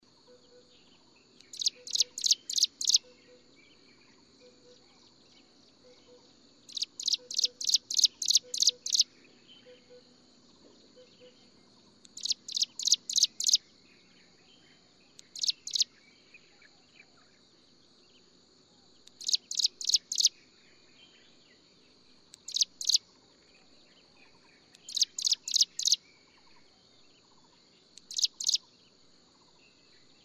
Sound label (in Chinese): 斑紋鷦鶯; 山鷦鶯; 扇尾鶯科/留鳥